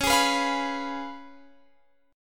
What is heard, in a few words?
Db 7th Db down Guitar Open F; Db7 strummed